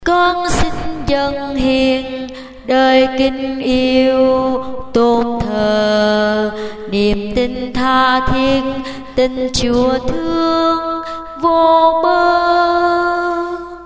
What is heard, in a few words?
tenor basso